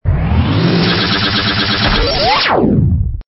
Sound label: Stinger; STINGERS